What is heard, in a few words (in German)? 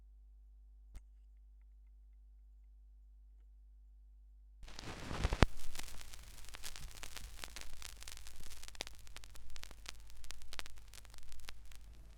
schallplattengeraeusche